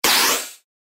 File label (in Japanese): 扉を開ける 近未来